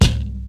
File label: 90s high tom drum single hit c sharp key